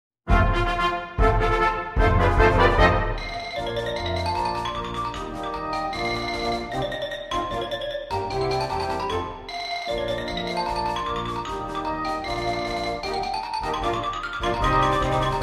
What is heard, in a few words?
Funny Mallets